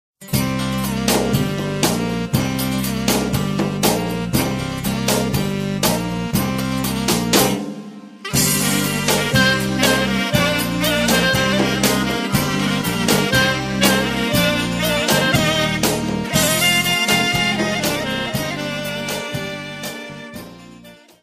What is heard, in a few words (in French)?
Valle Pogonishte, Albanie